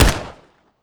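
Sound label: augolf fire player 9mm, weapons; gmod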